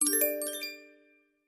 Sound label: treasure chest; Skillzgaming; battle Mania Desktop